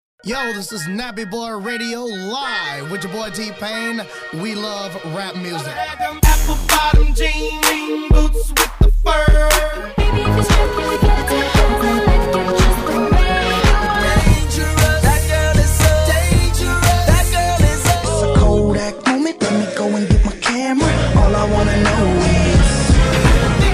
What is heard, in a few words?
Beat Match